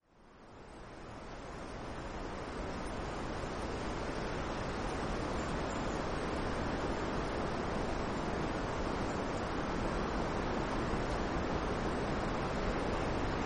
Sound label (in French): foret